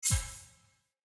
Melee Hit Critical; Weapons; Warnings

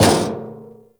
Physics Barrel Impact Medium, Metal Barrel

